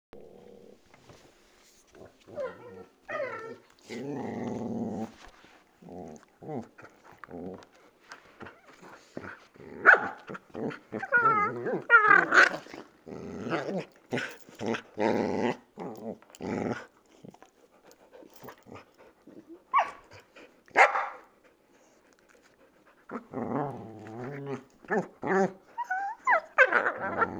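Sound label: puppy and adult, dog growling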